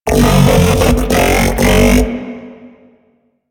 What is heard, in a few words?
Mech Ambient Vocal